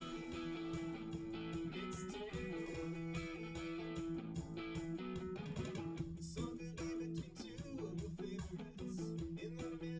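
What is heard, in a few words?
with drums live rec; SASSEC07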